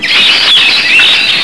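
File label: squeaks, rat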